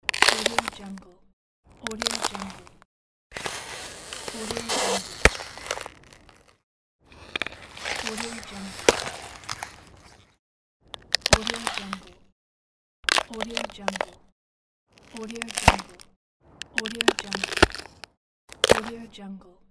crackling ice

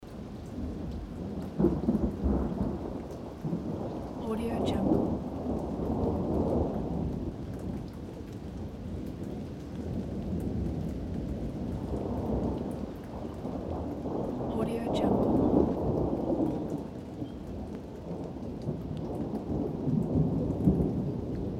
thunder with increasing rain; alanay

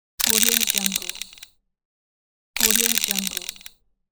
revolver pistol gun cylinder spin; prowaveaudio

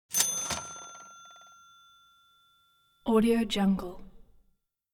cash register classic kaching; prosoundfx

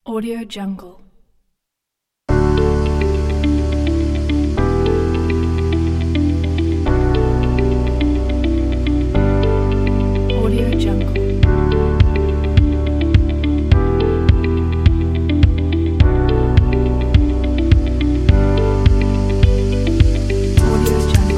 inspiring beautiful ambient

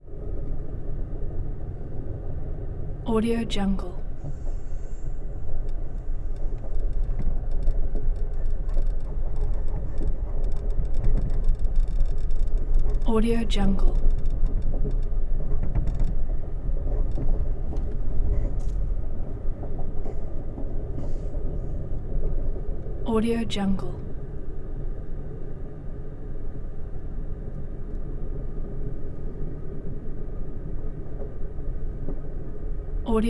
amtrak superliner; prosoundfx

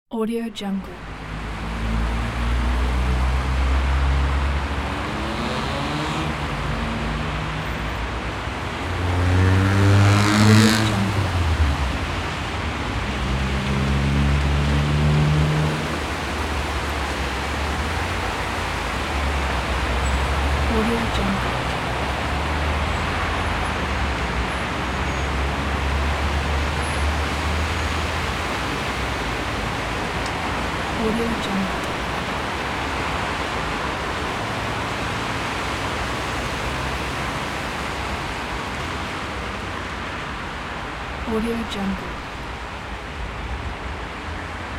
traffic background

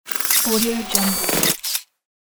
sci fi technology

